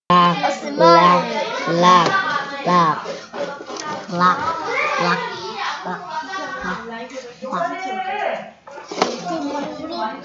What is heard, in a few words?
laugh